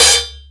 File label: Sluggo Drums, Drum Machines